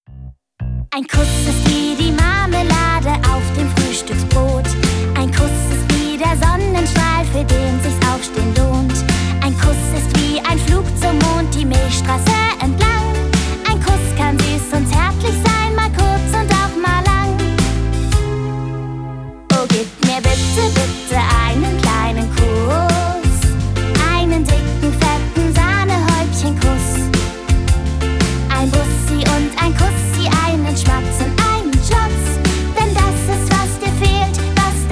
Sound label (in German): Song